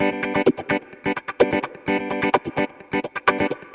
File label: Electric Guitar - G maj, 128BPM; Electric Guitar Kit; Vengeance Samples; Special Sounds